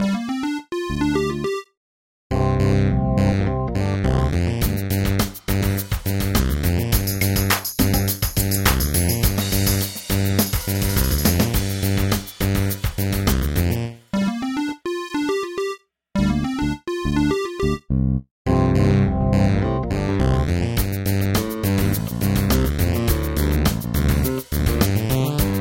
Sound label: Talking Rhythm